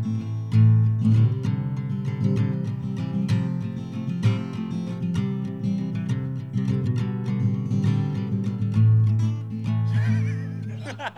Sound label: Guitar